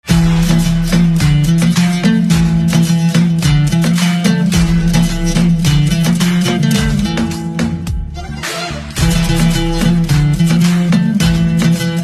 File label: Guitar